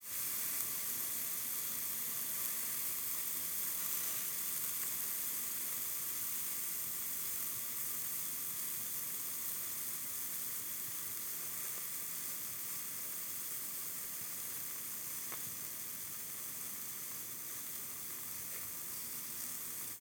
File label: small grill, Kitchenware; 3maze